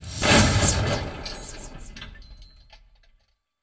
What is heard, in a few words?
Rotor Strike In; DCS